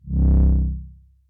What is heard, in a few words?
powerup; Incantation